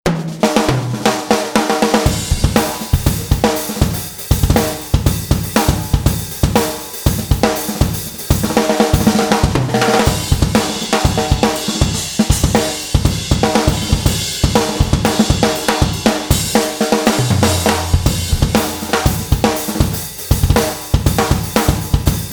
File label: Drums